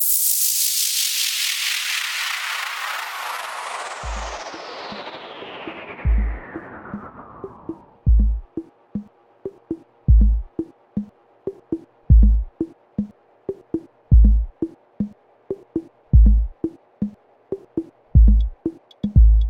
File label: Club meets Dubstep